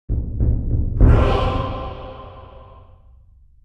Mobile game nordic vikings level up

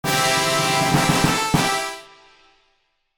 You win success victory fanfares

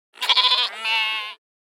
Goat bleating excited

